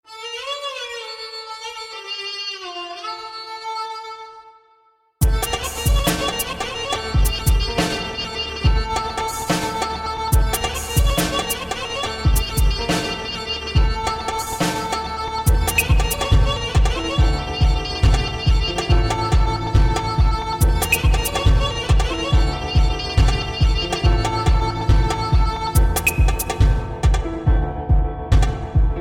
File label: Persian Beats